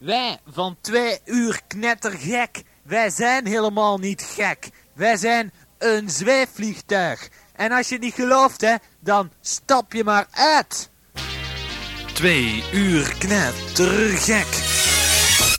Jingle zweefvliegtuig; Knettergek

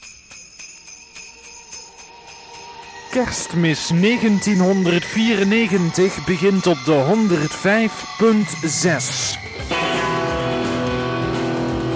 Uuropener; Pop-Poll 94

